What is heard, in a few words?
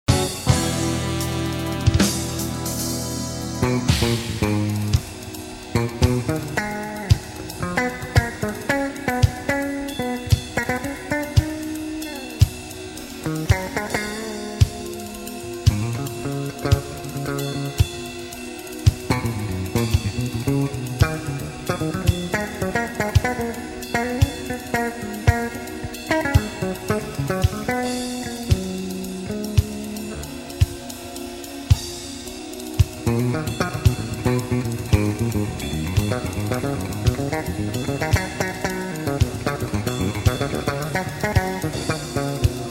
bass solo